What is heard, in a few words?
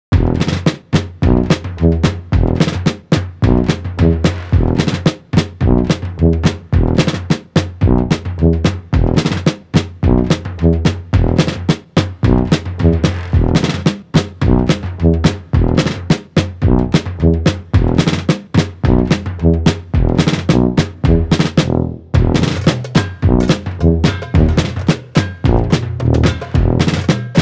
Underscore, Balkan Brass; Narrative Mind